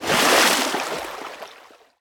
Piranhas, jump

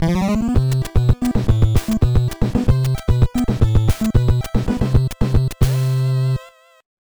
Level Up - Power Earned; Esper Dream 2; nes

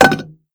metal pot hit

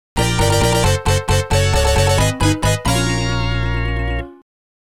Losing Fanfare; Ordyne Original Game Soundtrack; Dream Co., Ltd.

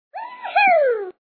Woo Hoo; Feed Fribbit Colors

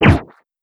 Silenced Gun Shot

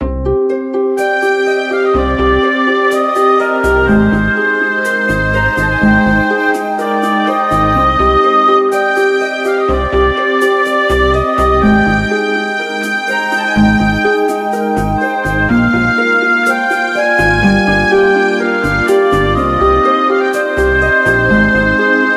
Dungeon; Chroma Quaternion

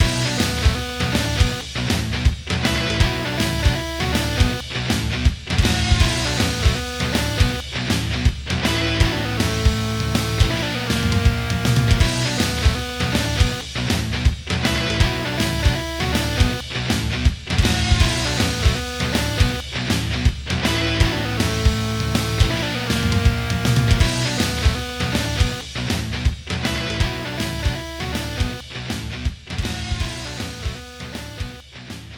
3d Music

